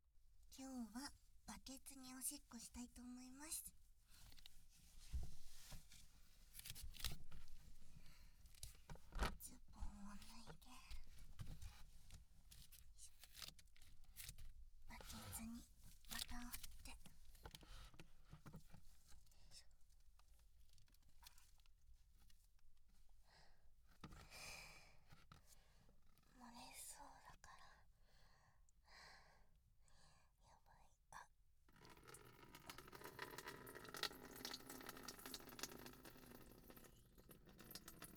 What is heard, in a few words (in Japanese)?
バケツに! オシッコですッ! (バイノーラル)